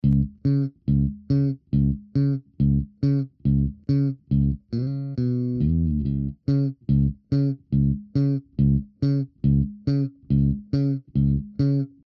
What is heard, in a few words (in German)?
E-Bass Original